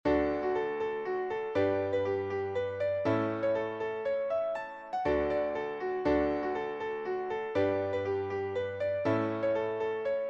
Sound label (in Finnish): polska bromarvista